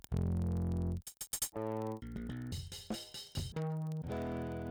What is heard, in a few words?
Saturator; Virtual Analog